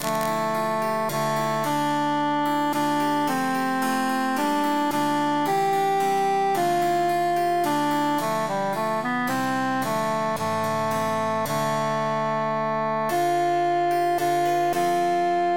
altus emphasized; tourdion

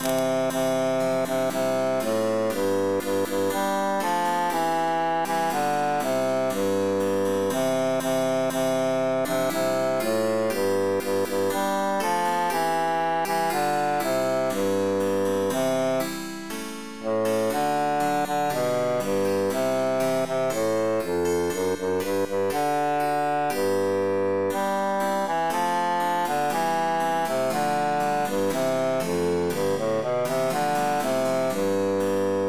bassus emphasized; since first i saw

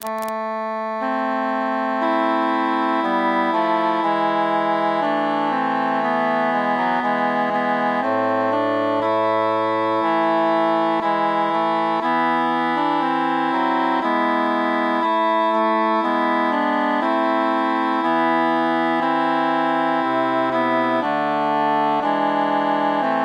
cantus mute; weep o mine eyes

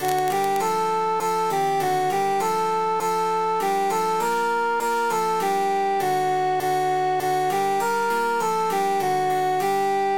altus emphasized

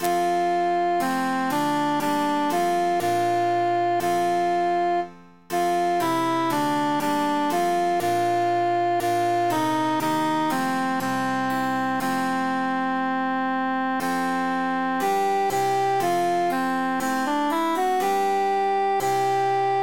altus emphasized; o occhi

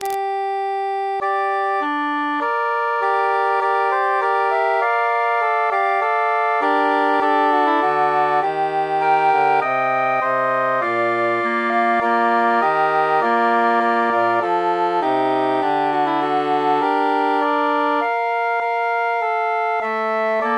tenor mute

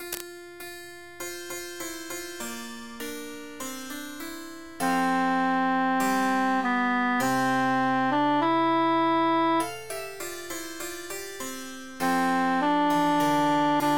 tenor emphasized; en natus

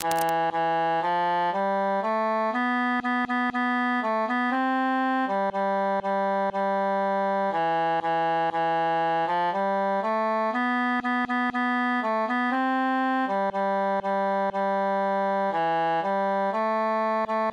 tenor solo; since first i saw

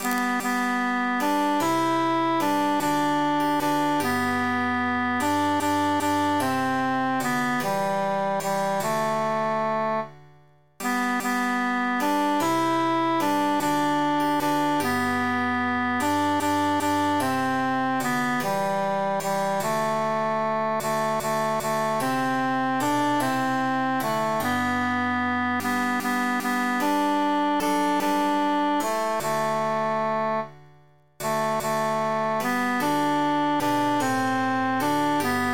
tenor emphasized; of all the birds

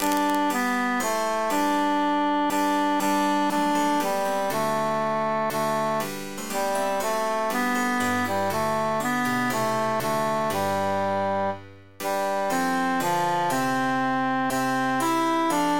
tenor emphasized; sing we and chant it

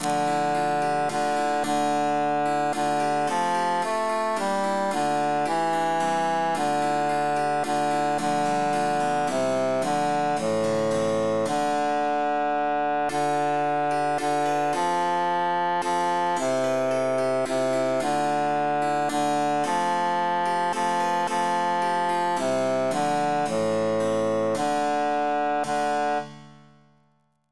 tourdion bassus emphasized

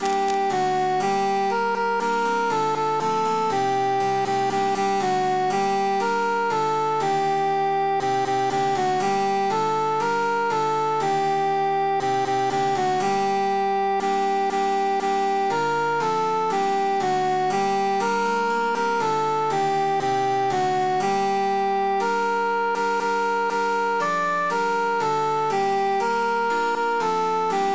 cantus emphasized; la la la

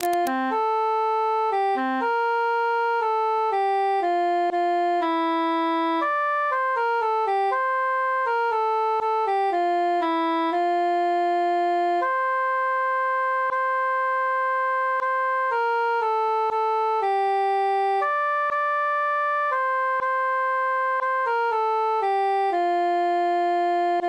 cantus solo; dona nobis pacem

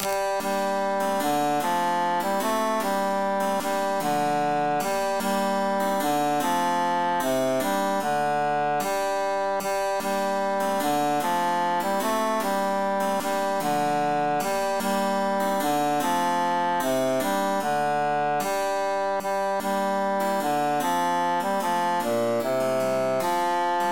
three country dances bassus emphasized

